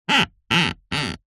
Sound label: string rub wood squeak; foley